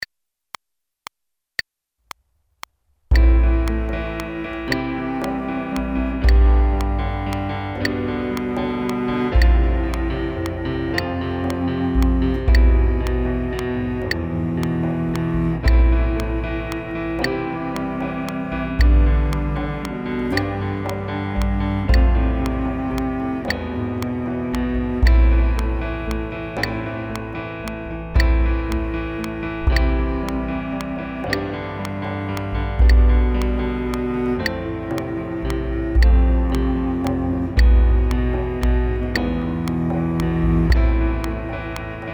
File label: Click Track; Greensleeves